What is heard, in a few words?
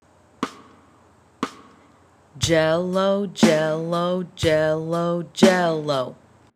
Eighth Notes Blue Jello